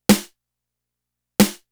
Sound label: Snare, Snare Patterns; Beat Components